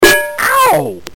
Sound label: hit with frying pan